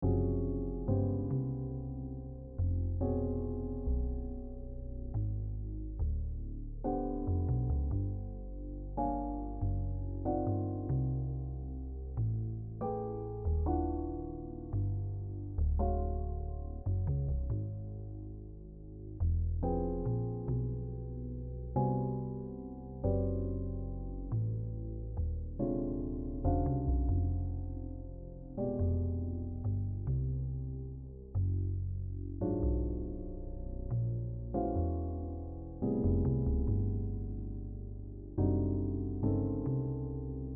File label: chords; CM4DbM4